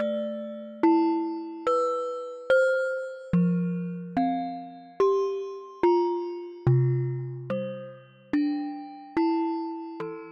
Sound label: Crystal Ball; this aint even poker ya joker